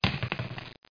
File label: roll